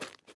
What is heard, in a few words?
ice step; teardown; ps5 windows xbox series xs